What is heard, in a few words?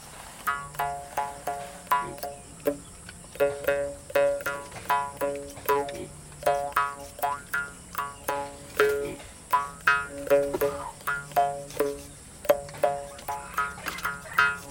Krongchui amplified